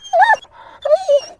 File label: Dog Whine; Harpoon